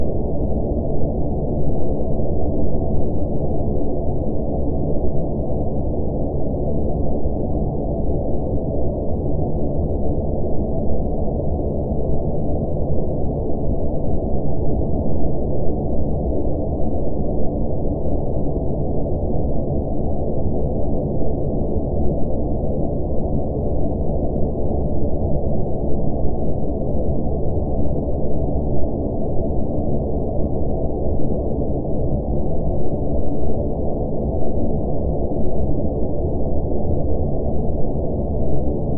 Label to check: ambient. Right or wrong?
Right